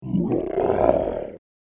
The Giant Orc, monster sound; Monster Sound Effects; fantasy sound effect; Andrew Fleming

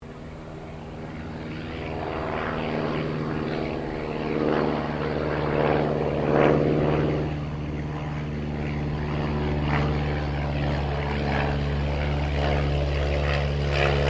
AIRCRAFT RYAN STM-S2 PASS; 1939 Ryan STM; Airplane; Airplanes; Vehicles

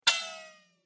Arcane Magic Poison Dart

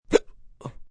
Hiccup Hic, Hiccup, male, short; human voice; Hiccupping

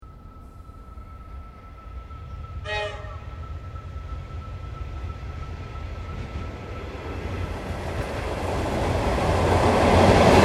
Train Melbourne Exterior Crossing Passes By; Public Train in Melbourne; Train Sound Effects; Vehicles

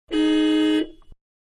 Mid Size Car Horn Medium Interior; Cars (Sound Effects:Vehicles); Manhattan Production Music

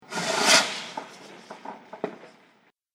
Fireworks Rocket Launching; Firework Sound Effects; Celebration, Party, Holidays, Birthday

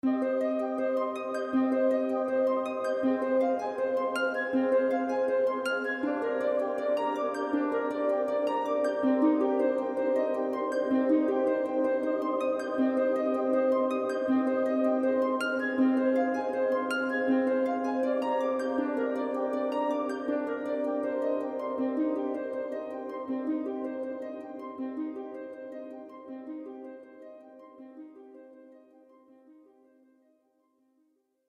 Transition Sad Music Ending; Transition Sound Effects

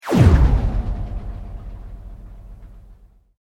Sweep Mechanical Medium

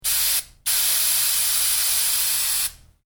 Spray Can: Spraying; Objects

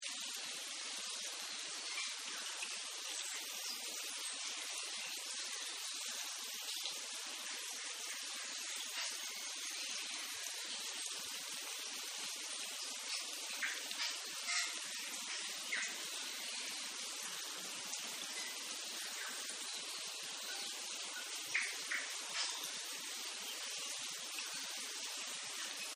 Rainforest; Nature Scenes

